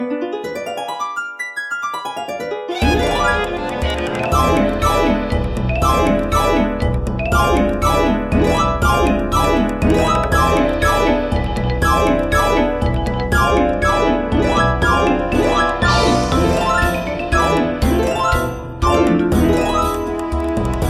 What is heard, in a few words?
Din's Power Harp; The Legend of Zelda Skyward Sword ~ Expanded Soundtrack